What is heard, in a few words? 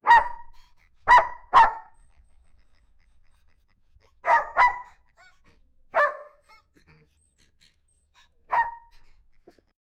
barking of little puppy